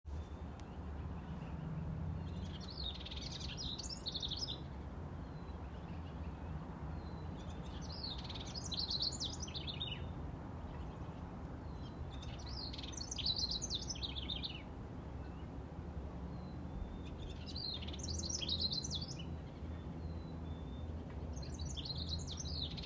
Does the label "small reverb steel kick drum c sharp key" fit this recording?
No